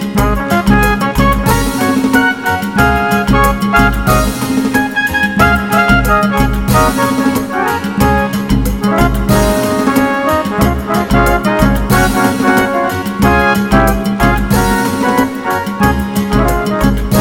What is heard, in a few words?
Hot Salsa